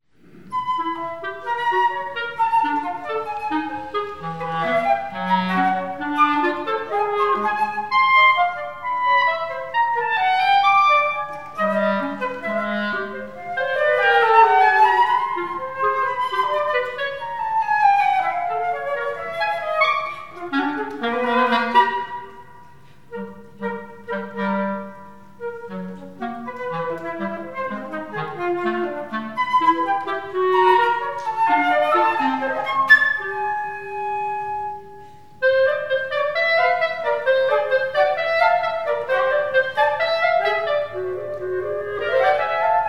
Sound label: duet